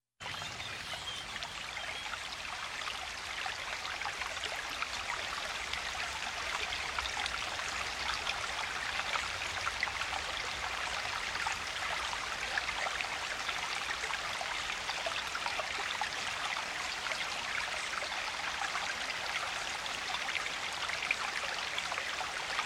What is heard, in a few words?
Creek as Day Fades